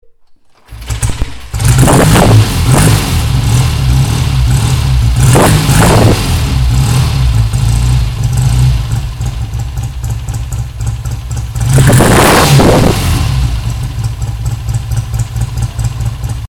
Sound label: triumph Tiger 650